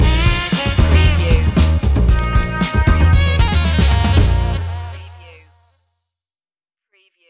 funky jazzy flow stinger

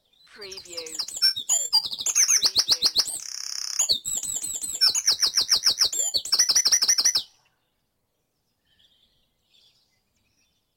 Dawn Chorus in Mold North Wales - Short Loop

